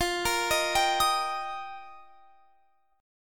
F 7th Suspended 2nd Suspended 4th; F7sus2sus4; arpeggiated; x,20,20,20,20,23

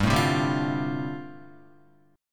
G 7th Suspended 2nd Suspended 4th G, Guitar; G7sus2sus4 strummed

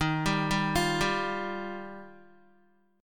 Eb Major Add 9th, Guitar; Ebadd9 arpeggiated; 10,10,7,10,7,x; JGuitar

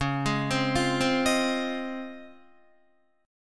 Db Major 9th, Guitar; arpeggiated; JGuitar

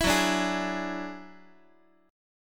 F sharp 7th Suspended 2nd Sharp 5th, Guitar; 4A,4E,5A,5D,5Gsharp,6Csharp; strummed; x,x,7,4,6,7

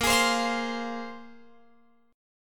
Bb 7th Suspended 2nd Bb, Guitar Open F; Bb7sus2 strummed

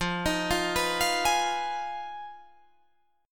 F Minor 7th Flat 5th F down Guitar Drop D

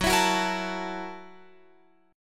Gb 7th Sharp 9th Gb Guitar Open F; Listen to Gb7#9 strummed

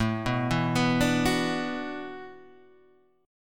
E 7th Suspended 2nd Suspended 4th, A

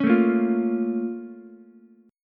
Bb Minor Major 7th Bb down Ukulele, BbmM7 chord; strummed; JGuitar

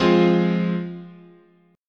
F Minor, guitar chord

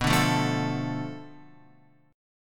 B-Minor 7th Flat 5th B down Guitar; Bm7b5; strummed; JGuitar

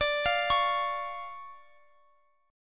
D Minor Major 7th D down Guitar Drop D

